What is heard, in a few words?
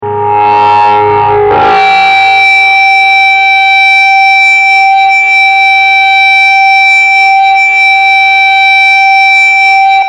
feedback